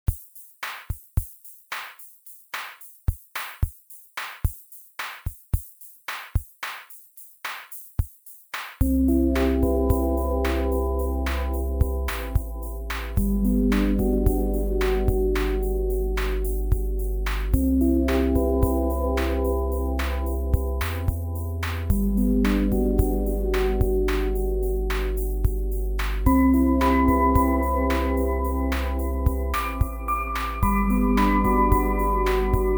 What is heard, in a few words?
Sinewave